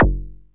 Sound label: bd soft; percussion